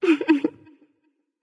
heh heh light; smite; voicepack; hel

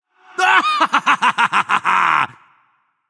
laugh; smite; anubis

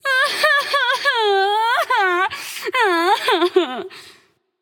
boo hoo; Smite; bastet

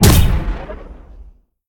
stun; smite; lancelot

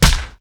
baseball hit; lilmana; smite; vamana

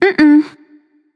disagree, voicepack; smite; pele

